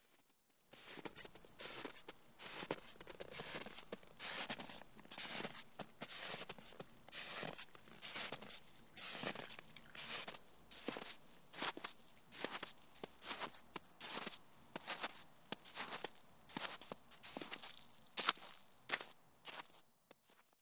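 footsteps on wet snow and ice, creak under the sole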